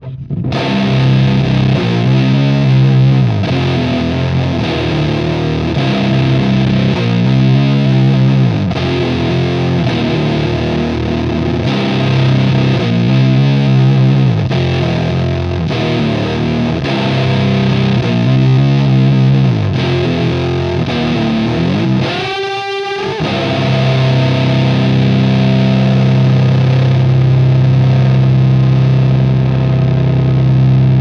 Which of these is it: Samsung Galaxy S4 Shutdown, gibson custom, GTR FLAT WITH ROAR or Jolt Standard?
GTR FLAT WITH ROAR